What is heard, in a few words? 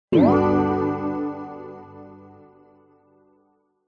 multimedia game tone short positive complete